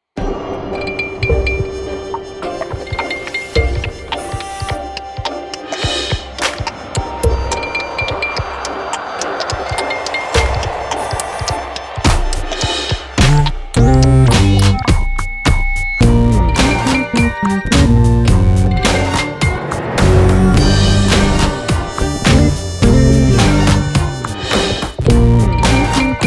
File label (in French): Piano Arpègé classique BPM 107; Générique